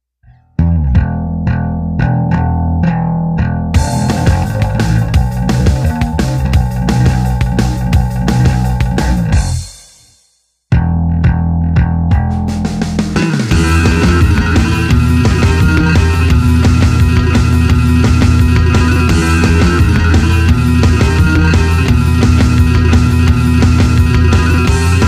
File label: Fidget Spinner on BASS GUITAR